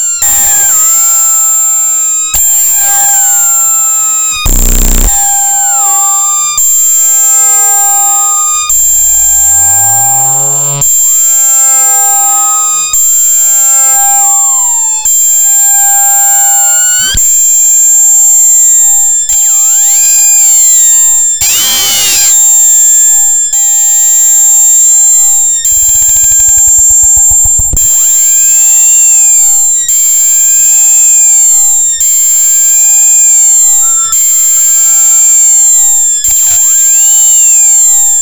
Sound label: feedback